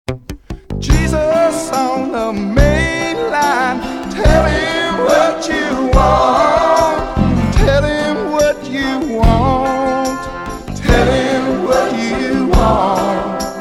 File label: chorus